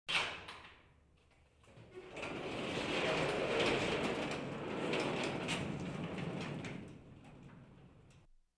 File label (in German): garagentor öffnen (manuell); Auto-Zweiraeder